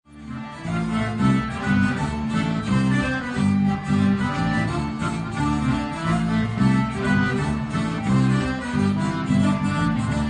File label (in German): Schottisch; Haejedalen; trad